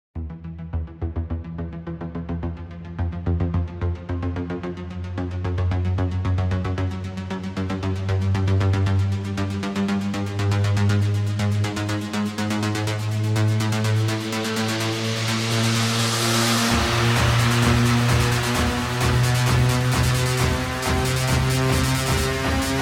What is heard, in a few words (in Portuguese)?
som automotivo